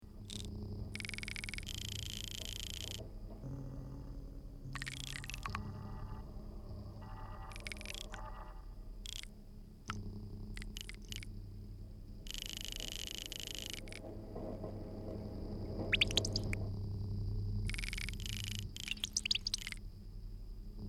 Fluoro hum

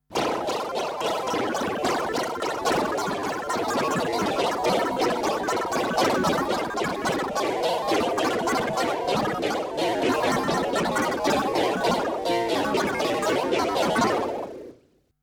Metal stutter

